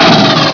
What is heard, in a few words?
m41a 3 round